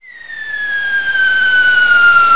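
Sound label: art strike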